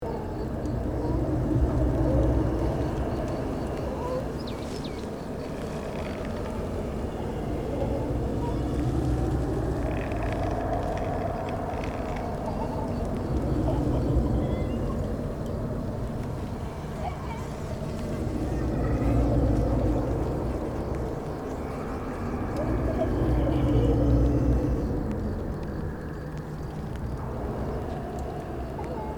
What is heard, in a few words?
Forsaken town ambience tension loop